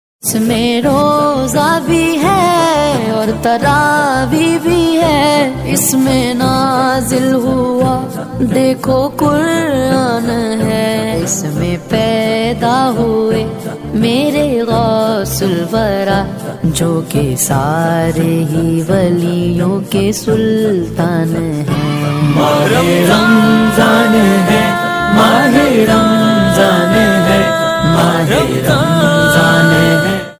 islamic ringtone

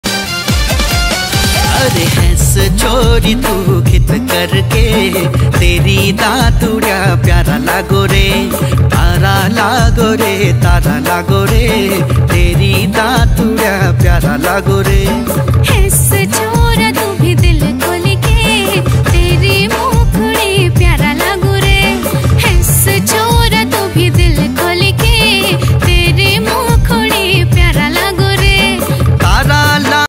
uttrakhandi ringtone

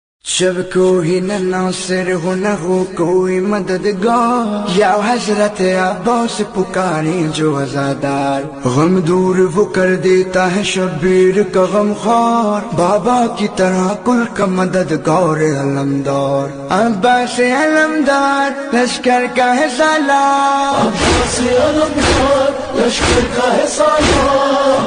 islamic ringtone

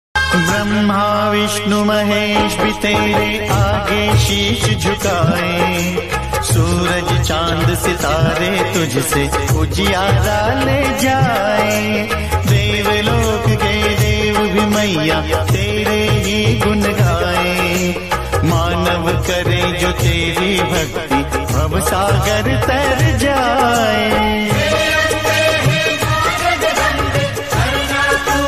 hindi bhakti ringtone

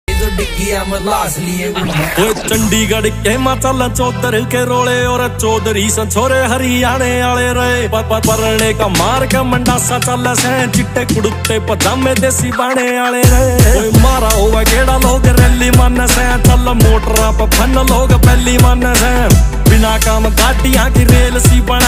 haryanvi ringtone

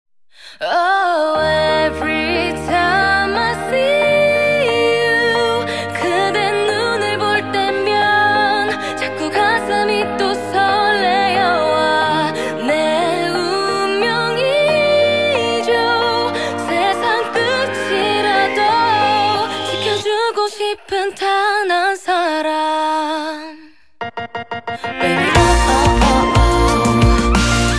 korean ringtone

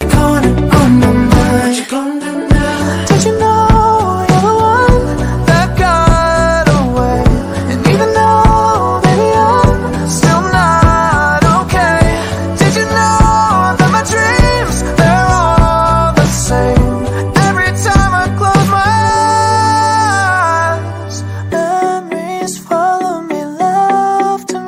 ringtone

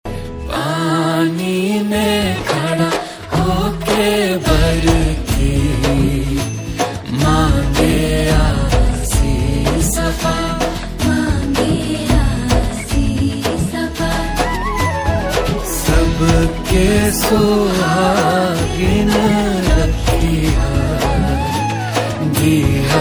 bhakti ringtone

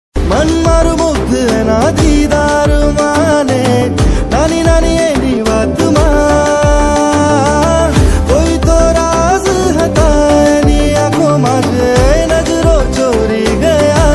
gujarati ringtone

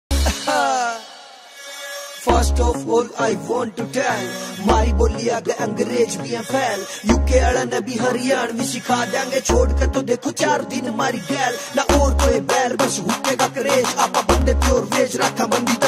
Haryanvi ringtone

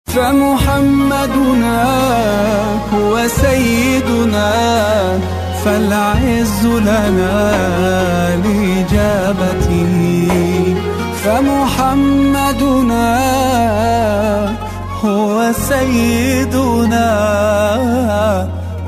arabic ringtone

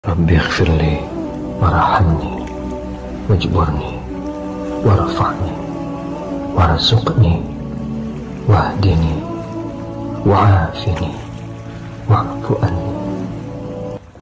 Quran Recitation arabic ringtone

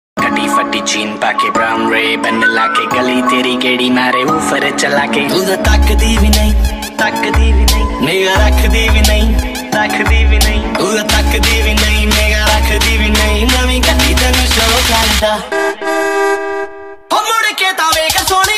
Munda Horn Blow karda punjabi ringtone

